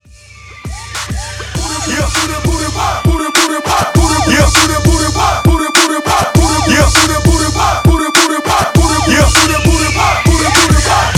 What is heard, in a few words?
Hip Breakz 100 BPM